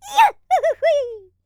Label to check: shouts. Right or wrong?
Right